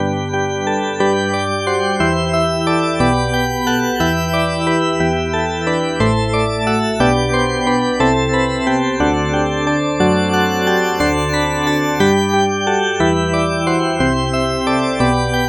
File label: drawbar organ